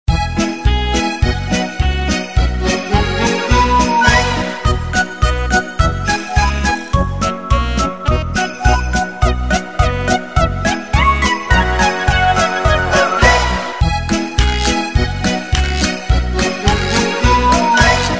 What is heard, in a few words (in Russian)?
Музыка к Игра с палочками